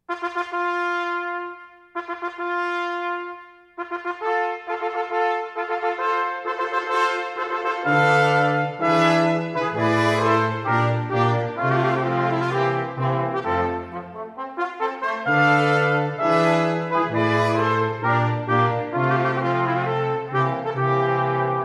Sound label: Wedding March, brass